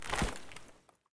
dirt footstep

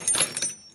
metal Crate Open

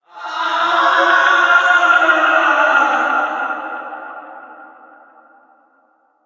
ghost scream

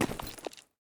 gravel footstep

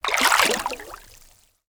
water footstep

